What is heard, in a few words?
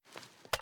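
foley assault rifle akilo47 drum reload empty end; weapons; viper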